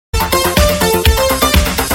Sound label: Loop